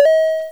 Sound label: combo; TheStable; Arcade; Melon